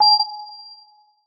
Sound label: pause back click